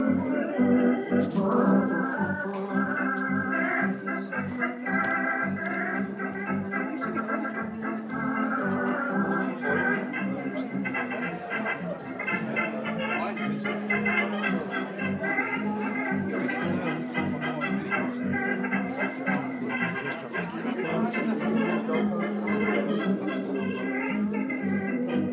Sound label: organ boo